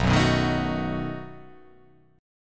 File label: B 7th B down